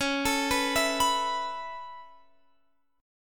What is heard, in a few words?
Db Minor 7th Sharp 5th Db down Guitar Standard; arpeggiated; JGuitar